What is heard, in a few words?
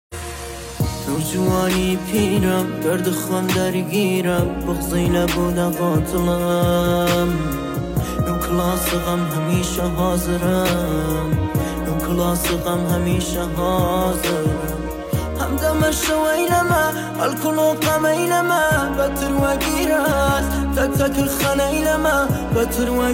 Piano Version